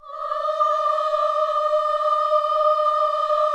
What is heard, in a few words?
KIDS CHOIR, Gospel; Spectrasonics; Vocal Planet